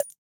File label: Pick Up Coin